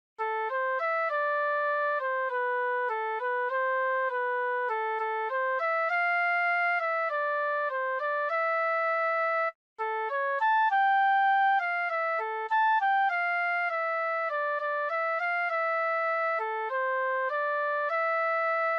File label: Flute Shvi